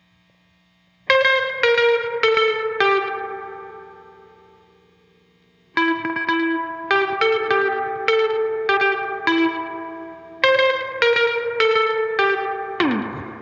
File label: guitar overdub; guitar overdub gareth